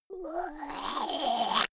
monster gurgling high; kreaturen

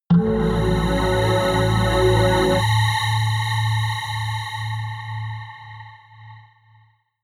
synth hit steam; whooshes rises

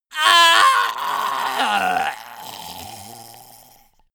male die gurgling; soundpool

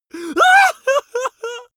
male really afraid whimper; vocals; comedy-cartoon; soundpool

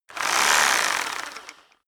rock drill hammer rev long; maschinen; bohrer; soundpool

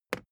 briefcase plastic handle bounce soft; industrie wirtschaft; bueroarbeit

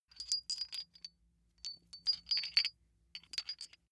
small metal handling jingle; industrie wirtschaft; metall

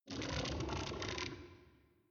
beast slobber deep wet, comedy cartoon; soundpool

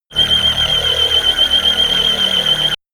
shot annoying buzzing tooth low; zaps buzzes

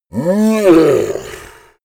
yeti growl struggle

